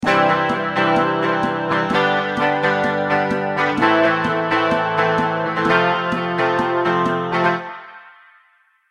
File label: chord progression c g am f, electric guitar